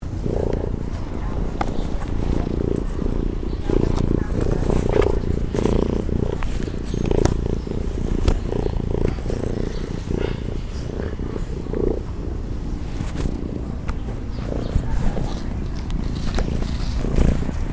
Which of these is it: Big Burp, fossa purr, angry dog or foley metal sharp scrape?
fossa purr